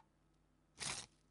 el papel

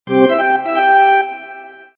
trompeta baseball

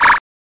boton

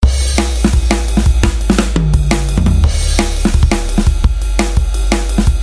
tambor

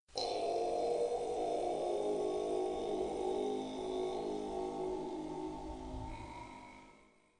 budistas

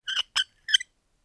tornillo oxidado

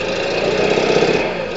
coche

